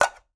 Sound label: Plastic Bowl, Kitchen